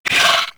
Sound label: Vocal Pain; Warframe; Moa Satyr